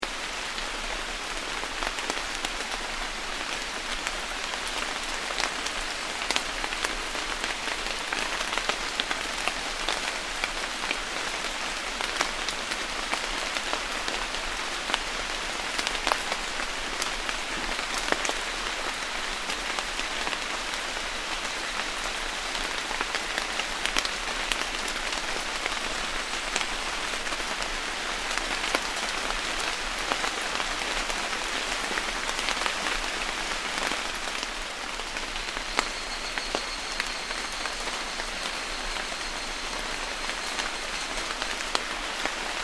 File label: Ambience Sannohe Summer Rain Insects, Storms in Sannohe Japan; Outdoor Sound Effects; Outdoors (Sound Effects:Backgrounds); Foundation1